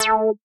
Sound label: synth